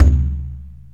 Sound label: conga, drum machines, LM-2